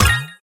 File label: collect; pinball